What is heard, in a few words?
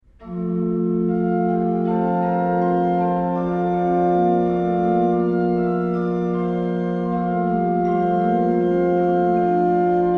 Praeludium Toccata F dur; J. Seger